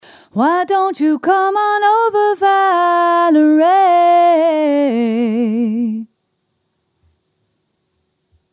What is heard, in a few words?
Soprano Ending